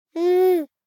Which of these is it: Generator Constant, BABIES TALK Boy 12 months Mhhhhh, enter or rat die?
BABIES TALK Boy 12 months Mhhhhh